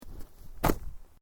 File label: Footsteps Boots Snow Shallow Jump; Footstep Foley; Footsteps (Sound Effects:Human)